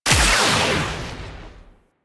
Moa Ability Charge Blast; Dera Moa

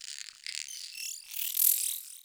Venus Landscape Close Texture, Ambience; Warframe; Venus Open World

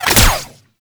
gunbrella bullet deflect; Weapons; Warframe; Tenno; Koumei

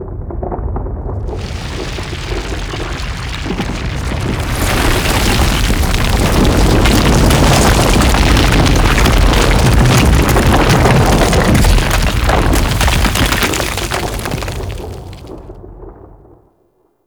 Rock Avalanche; Warframe; Ambience; Grineer Forest; Forest Vay Hek Boss Room

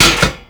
Cast Iron Hit; Gameplay; Awakening Remaster

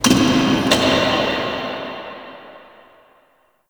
Subway Misc; Nineteen Ninety Nine

